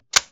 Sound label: ui click; Embalming Girl Client